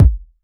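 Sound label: kick electronic; Crypton - DrumBase5000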